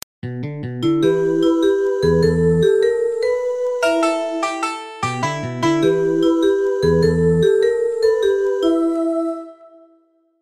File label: iphone ringtone